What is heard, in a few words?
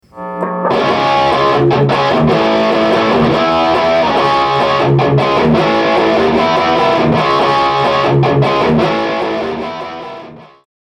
both tracks no ducking